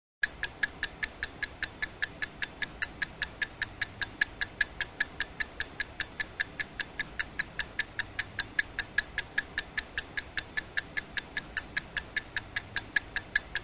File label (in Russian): bystroe tikanie chasov; тиканье